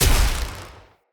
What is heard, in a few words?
Cannon Tesla Shot; Weapons; Player